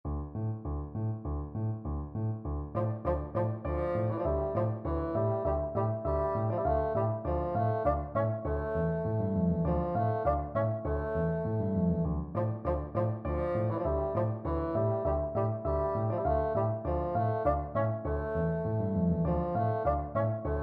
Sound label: danses des cygnets, bassoon